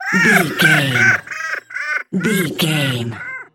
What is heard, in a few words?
goblin fairy laugh intense